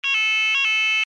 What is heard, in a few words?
alarm tone, sounder alarm tone; sounder beacon